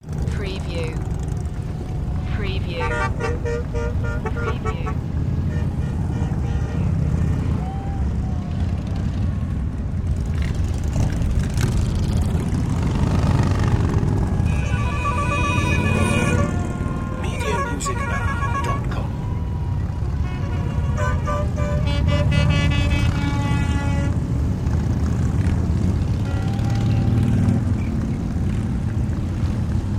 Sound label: Rolling Thunder